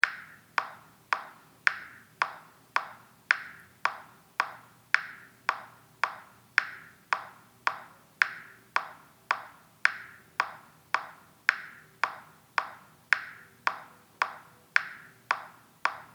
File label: Ukrainian Bell Carol